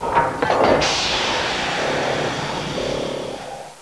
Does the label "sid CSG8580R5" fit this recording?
No